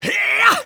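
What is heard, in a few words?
voice punch grand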